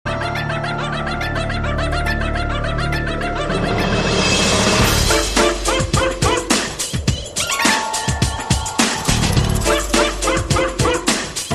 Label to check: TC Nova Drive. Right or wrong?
Wrong